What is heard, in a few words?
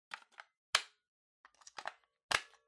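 Ceiling Light remote control Battery Insert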